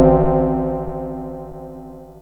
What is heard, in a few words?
Gong Sound, CSG8580R5; sid